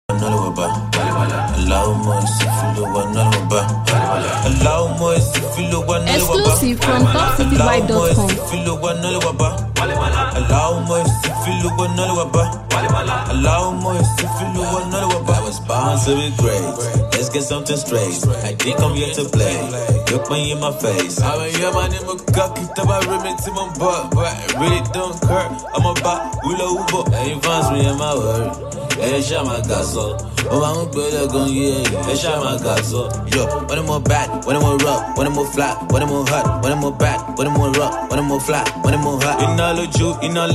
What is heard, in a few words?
Rap Freestyle